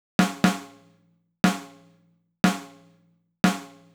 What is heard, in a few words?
Pearl Snare; hydrogen